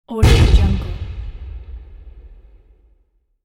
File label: cannon shot